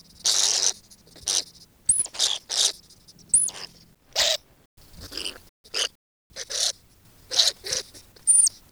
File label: Spermophilus suslicus chirr; Speckled ground squirrel; If to approach a speckled ground squirrel too close, one can hear the threatening chirr; The alarm calls